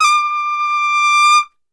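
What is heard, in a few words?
D 4 trumpet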